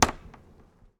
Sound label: jump